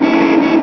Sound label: phone